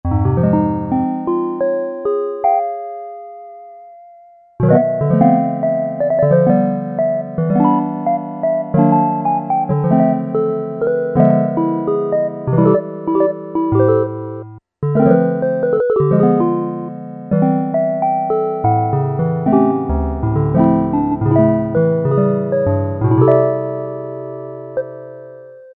vibraphone, Casio MT-68; preset sounds

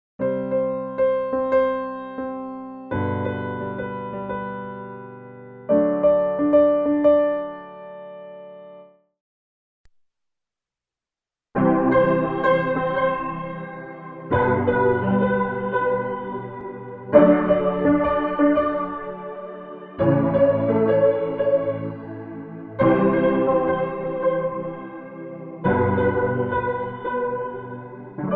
Hexa Flange

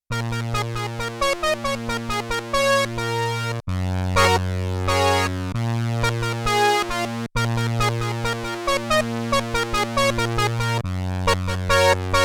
Special Filtered, Prophet 5; Yamaha TX16W

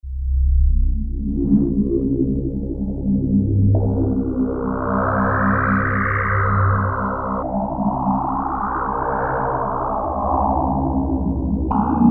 Roland MKS-50; Factory examples

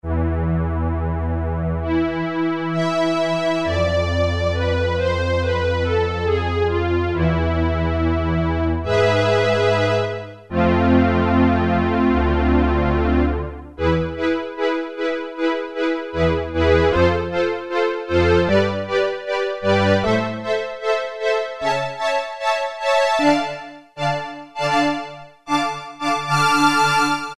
Moving Strings, Roland Juno-106; Factory Preset

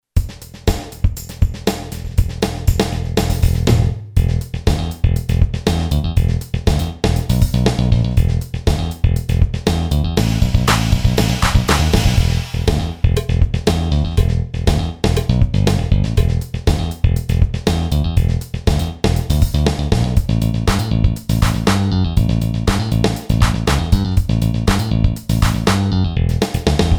HEAVY FUNK, Boss DR-3

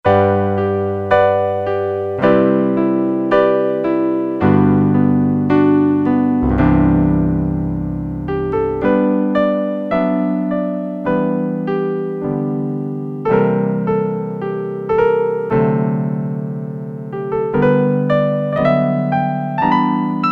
DIGPIANO, Piano, Korg DSS-1; Vibes, Glock, Piano, Clav

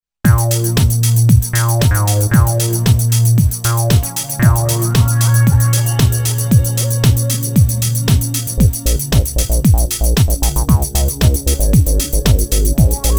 SWEET GROOVE

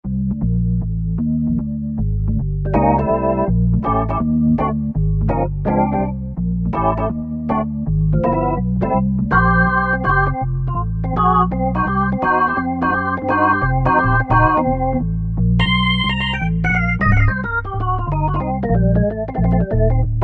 Organ 3, Korg T3

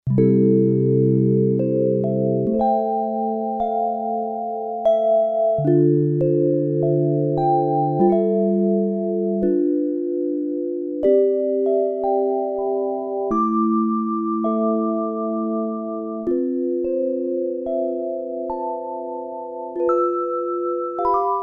vibraphone, Korg DW-8000; Factory Patches

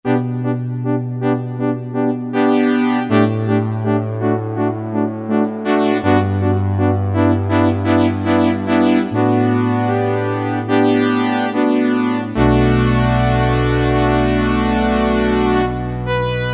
SYNSTR, Korg DSS-1; Flutes & Harp

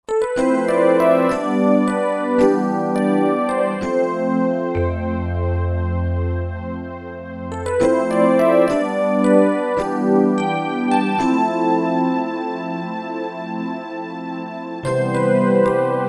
Roland JV-2080 JV Rhodes+

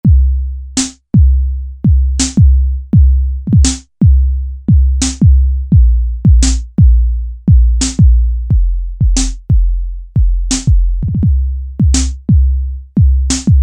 Retro BD-SD, Korg MS2000; Factory Patches

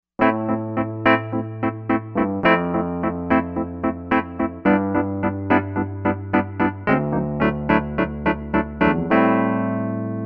Wurli

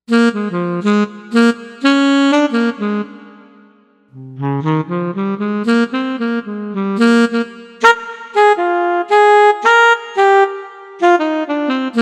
Alto Sax, Korg M1Rex; Factory Patches

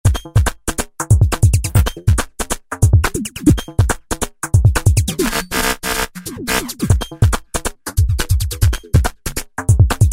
Hi Tech & Nz, Roland V-Synth; Factory Patches

